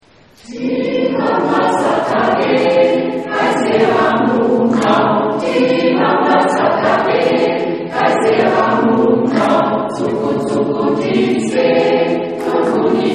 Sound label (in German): chor; timama